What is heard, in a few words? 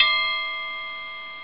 triangle; OctaMED SoundStudio V1